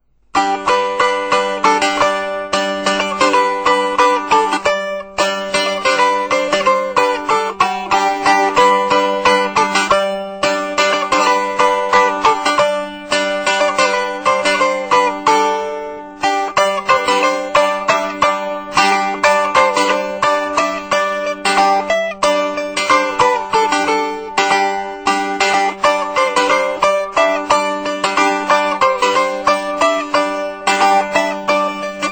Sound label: strum; strumstick; fruehling